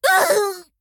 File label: Female Pirate Death